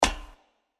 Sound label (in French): Footstep passerelle metalique; Blind Survivor